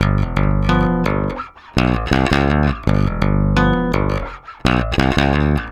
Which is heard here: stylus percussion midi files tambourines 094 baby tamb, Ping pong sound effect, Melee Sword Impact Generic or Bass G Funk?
Bass G Funk